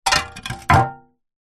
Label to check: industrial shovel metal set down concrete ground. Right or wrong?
Right